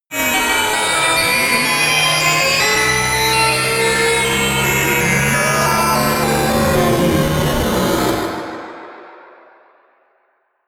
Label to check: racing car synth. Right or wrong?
Right